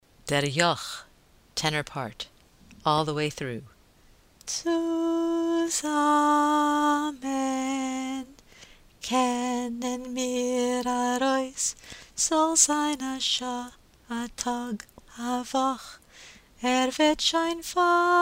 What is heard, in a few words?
tenor; Der Yokh